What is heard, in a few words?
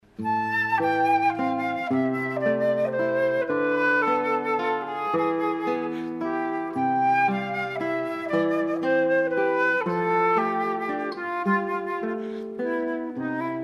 Valse, flute